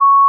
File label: D flat 4, pure tones